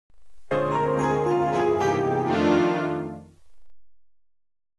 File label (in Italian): jingle pub; rai1; worldcup06